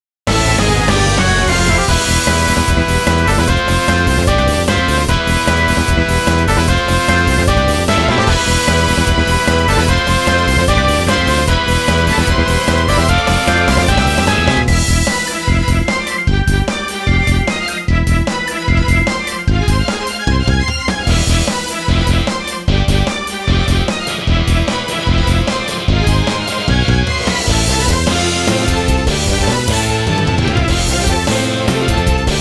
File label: battle time, BGM